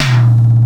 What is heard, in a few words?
PTX TOM; Crypton - DrumBase5000